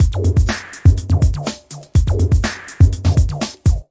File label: tabla, drum loops and breaks, 123 bpm